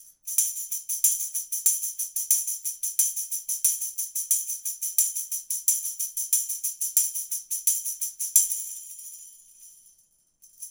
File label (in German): Schellenkranz; neumann tlm103